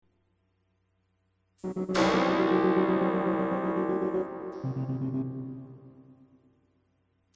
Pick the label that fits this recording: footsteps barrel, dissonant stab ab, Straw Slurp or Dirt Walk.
dissonant stab ab